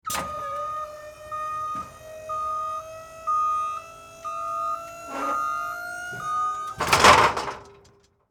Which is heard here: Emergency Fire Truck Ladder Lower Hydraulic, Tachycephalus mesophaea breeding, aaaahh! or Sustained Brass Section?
Emergency Fire Truck Ladder Lower Hydraulic